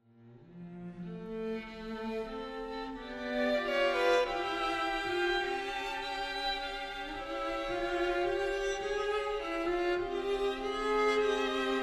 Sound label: string quartet; rathaus